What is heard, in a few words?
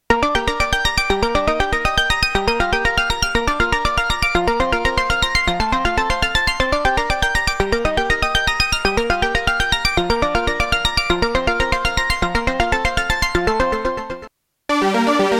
Korg Prologue